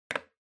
Music Box Foley Put Down

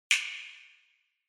Castanets

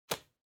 Bingo Card Put

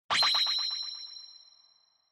Flash Low Mid; Onoma

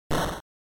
General Noise Single

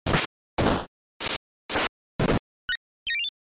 NES Fighting

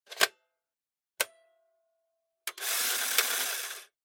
Camera Instant Cartridge Insert

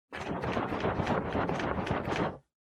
Sensu Fan

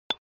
SNES Golf Putter

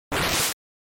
GB Fighting Attack

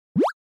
Motion Pop

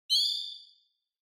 Onoma Flash High Short

